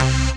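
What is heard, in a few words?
Rave Synth, Dance Mega Jungle Rave; Best Service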